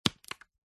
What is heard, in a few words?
household switch plastic slide click; zapsplat